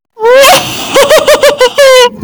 witch laugh loud

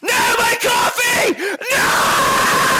coffee scream

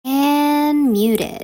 otter muted

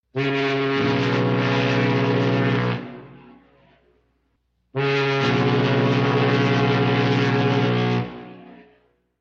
foghorn; dual

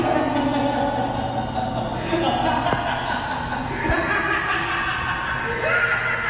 alternate laugh; mandela catalogue

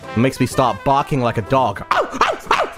barking

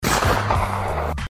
dap up impact sound

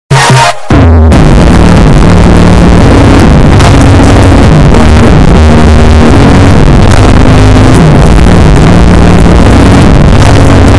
bass boosted outro song

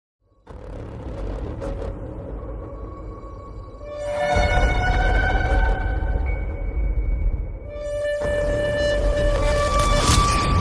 creepy

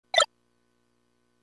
item drop; brawl

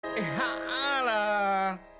haah lahhhhhh

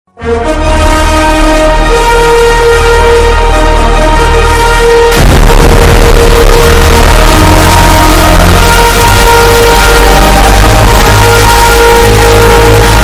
bass boostet

